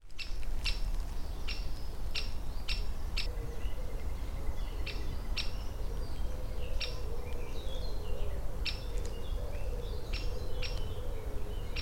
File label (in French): pic épeiche cri